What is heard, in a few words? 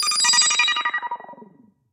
synth chime msg tone